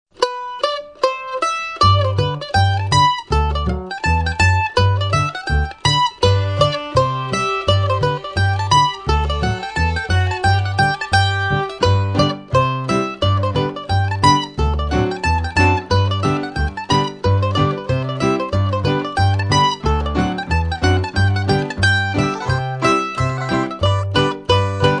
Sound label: Polka, ragtime